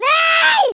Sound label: death; Mini Militia